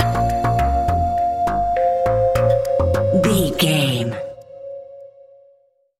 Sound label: mysterious task video game stinger